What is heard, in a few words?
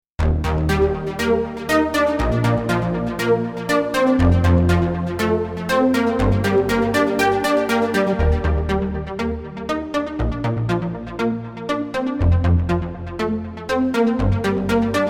club stack; Jupiter-80 v2